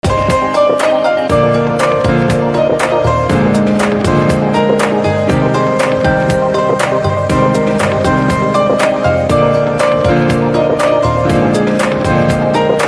Piano Instrumental Ringtone

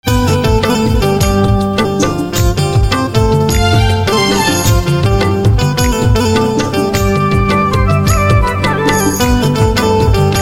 Banjo Instrumental Ringtone; chhattisgarhi instrumental ringtone

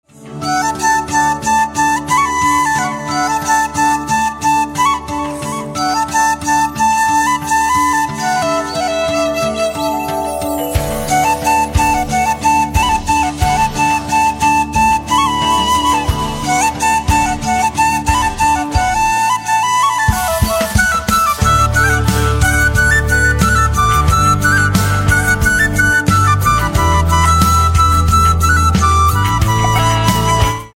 Piccola Instrumental Ringtone

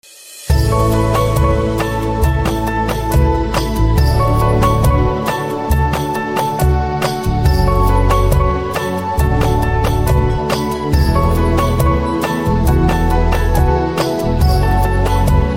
Piano Instrumental Ringtone

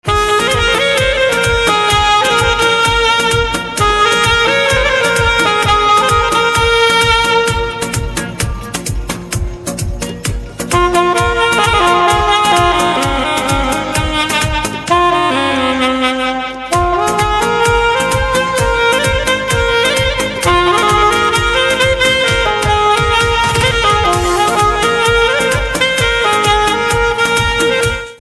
Saxophone Instrumental Ringtone; bhojpuri instrumental ringtone

